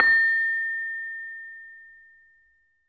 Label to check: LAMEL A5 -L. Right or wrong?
Right